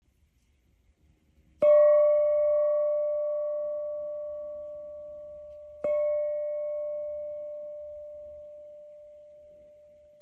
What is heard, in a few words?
Chakra, earth gong chakra flower of life 15cm; serenity earth gong chakra flower of life 15cm 6